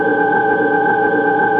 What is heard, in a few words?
ufo; quake2; kitsune